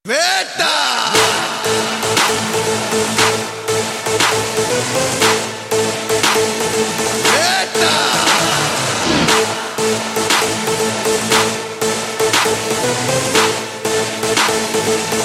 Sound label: Theme Telugu Ringtone